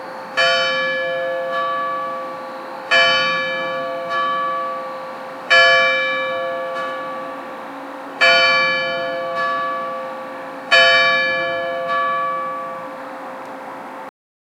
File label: church bell ringing